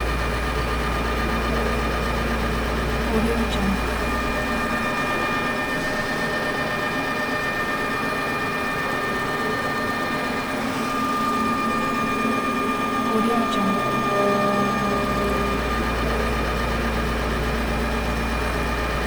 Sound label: ambience rotating lazer cutt; Robotic Lifeforms 2